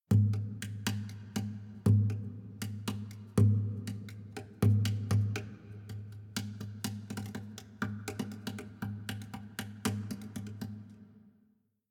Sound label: Savannah Music